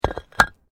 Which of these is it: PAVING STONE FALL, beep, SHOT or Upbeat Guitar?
PAVING STONE FALL